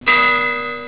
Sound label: buoy